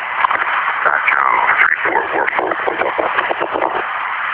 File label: banjo